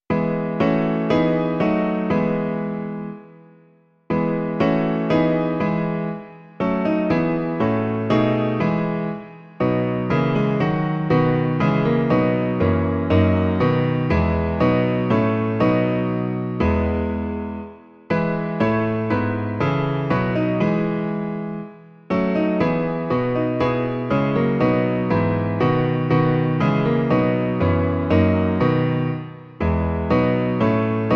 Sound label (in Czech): klavír; Hospodine, všech věcí pane; Klavírní verze